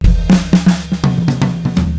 Loops mutantes

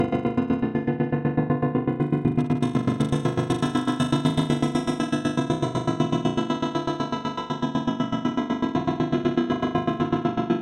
Ritmo Sonidos Guit

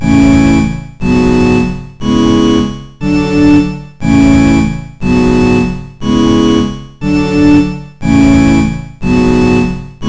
bandoneones mix

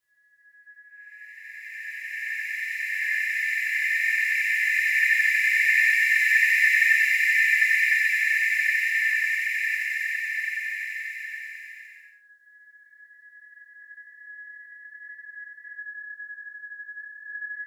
Loops mutantes

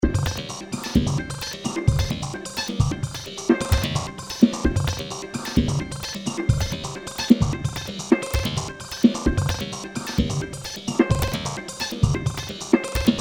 Bateria; Court Society; Zentist

